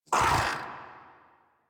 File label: Catbrow Combat Vocal Attack Short; Warframe